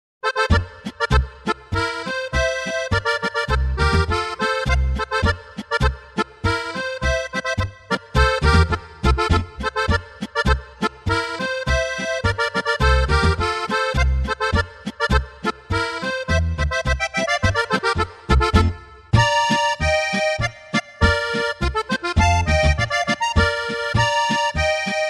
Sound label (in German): Boarischer